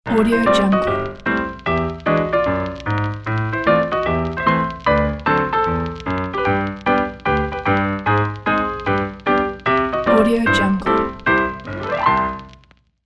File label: vinyl piano logo